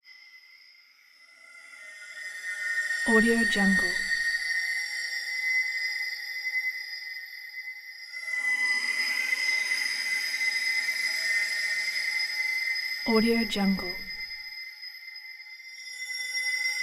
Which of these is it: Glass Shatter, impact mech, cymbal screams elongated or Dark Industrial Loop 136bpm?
cymbal screams elongated